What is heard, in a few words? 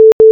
beep duplicate, Scanner; Gunni – Scanner PRO